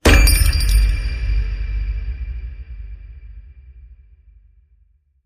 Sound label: Desolate Playground Swing Boom